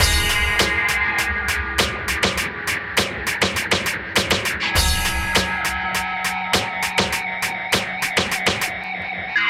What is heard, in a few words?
Indy Beat, Synchro Funk, Beats; Sonic Foundry (Sony Creative Software)